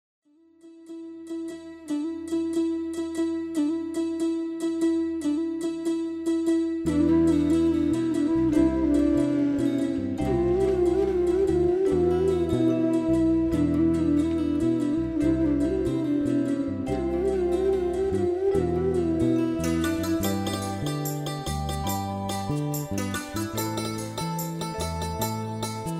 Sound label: Instrumental